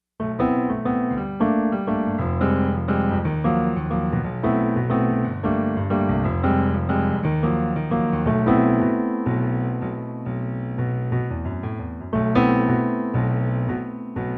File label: b minor blues